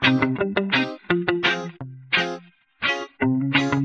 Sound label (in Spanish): STEM GUITARRAS ALL; multitracks